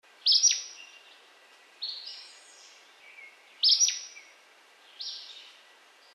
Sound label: flycatcher acadian; species/birds; wbba